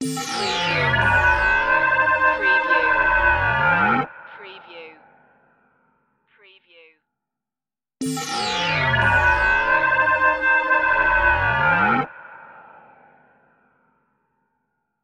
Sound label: Short n Evil